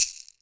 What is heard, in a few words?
MARACA; Drums&Percussion; E-MU Producer Series Vol. 7 – Old World Instruments